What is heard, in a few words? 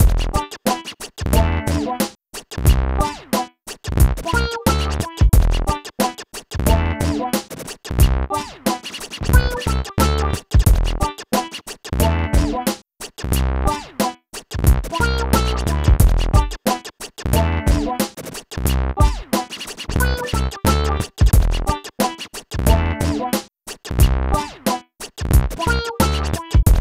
Rap Battle (Game Over)